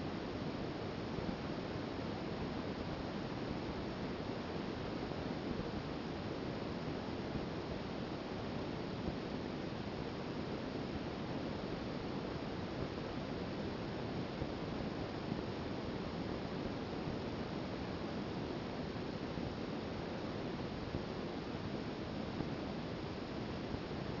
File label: noise; TaurusA